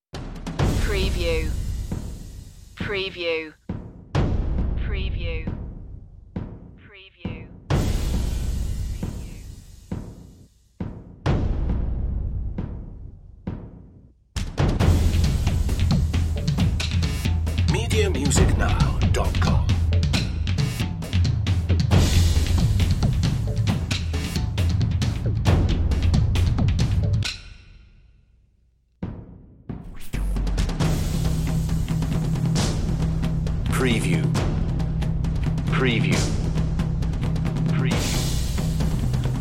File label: samurai percussion